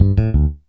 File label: BEL; USB Soundscan vol.31; Miro s Grooves; AKAI